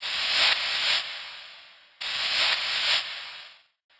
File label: Atmos FX, Atmosphere & FX; Touch Loops; BlissedOutBeats